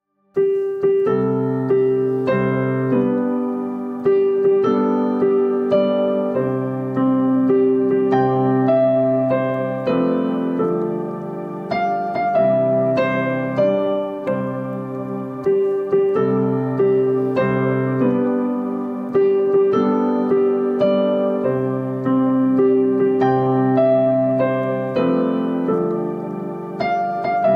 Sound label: HAPPY BIRTHDAY TO YOU PIANO INSTRUMENTAL